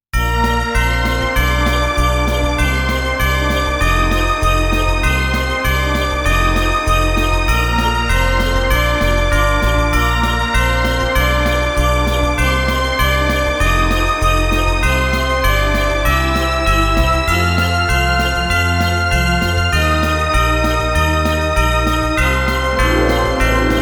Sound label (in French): Noel jingle